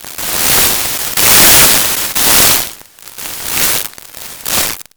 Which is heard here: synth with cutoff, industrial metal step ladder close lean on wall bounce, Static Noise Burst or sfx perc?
Static Noise Burst